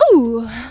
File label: LOVE OOH; AMG